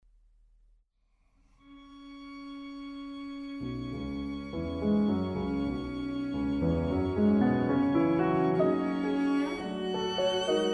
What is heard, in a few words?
trio allegro animato; Tailleferre